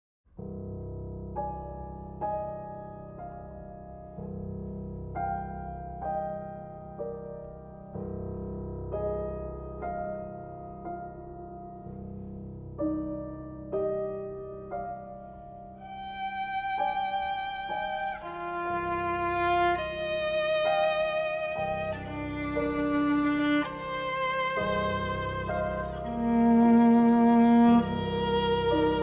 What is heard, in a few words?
Sonata For Viola and Piano; Naoumoff